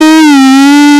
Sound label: ooooooooooooooohhh